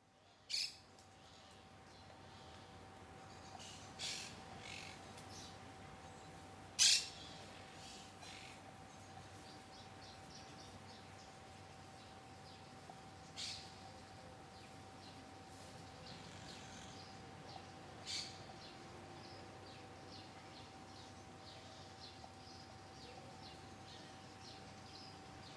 Surround garden of exotic birds Stereo Downmix, Early morning; Garden of Exotic Birds 1 - Early morning in a garden park in Canary Islands, off the coast of West Africa.; Bird Sound Effects